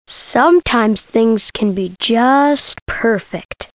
KISSING

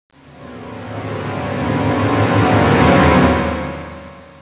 TENSION; PC Action 1999 February (Bonus); Dark; thief

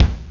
Bass Dru; drum kit

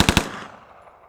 Machine Gun Fire; 1944 Battle Of The Bulge

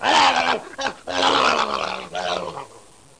Growl; Bible Fun Pack; Education Publishing Concepts

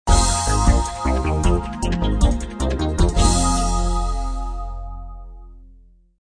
level music; lightning break

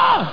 ahhh

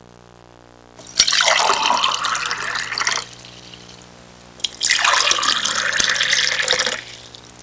filling, household; Web Clip Empire 150,000

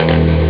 Yello guitar

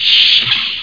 door open; dithell in space

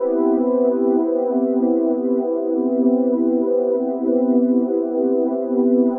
Cream Pad (min7th); Loop Samples; Futurist

